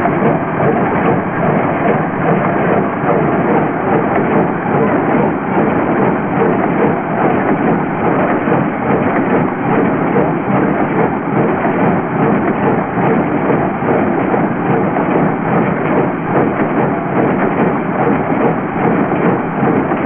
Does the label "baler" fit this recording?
Yes